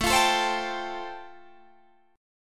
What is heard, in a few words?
F Major Add 9th A